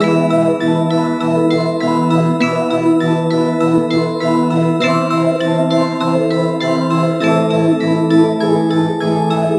Melody E 100; HipHop; Hip Hop and Trap; Universe; Ghosthack

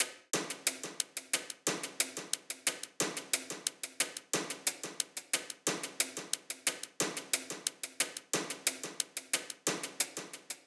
Top Loop 90; Loops; Latitude; Ghosthack; Drum Mega Bundle Demo; Abstract Percussions Volume 4

